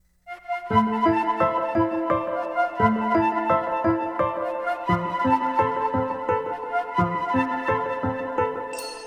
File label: Christmas Dungeon Opening cue